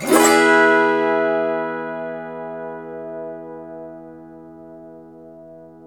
AUTO HARP

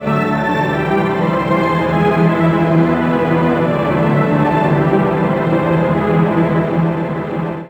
BAC, MUSICMOTIVES; Best Service ProSamples vol.49 - FX-Area; AKAI

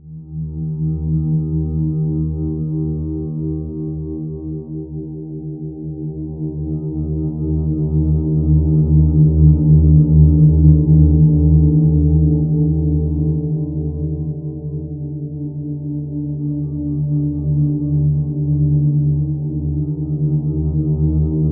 ALIEN, Ominous Drones; USB Soundscan vol.48; AKAI; LOWTONES

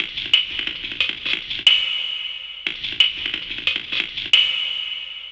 Runnin Break, Drum & Bass, Beats & Breaks; Fluid Dynamics

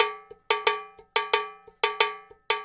Cow Bell, Latin Percussion

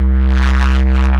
C Bass, DnB One Shots

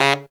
TENOR C#; Zero-G; Phantom Horns

